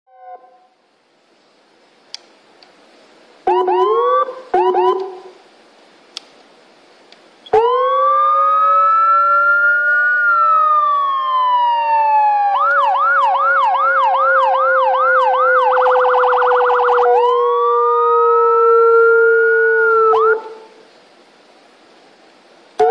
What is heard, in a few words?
whelen dual tone